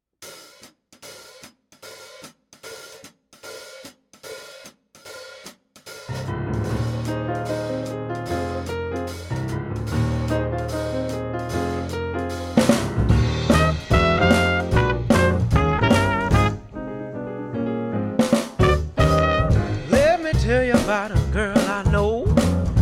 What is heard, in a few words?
Jazz